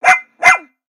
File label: Pet Dog Robotic Happy; creatures; pets; Lego